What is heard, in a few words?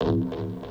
Bass stab, soundkits